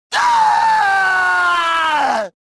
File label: falling; reborn